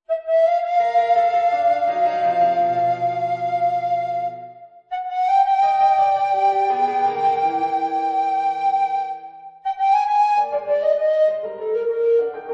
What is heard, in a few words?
Sonata Treble Recorder III, recorder